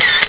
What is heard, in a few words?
Shutter; LG; CU320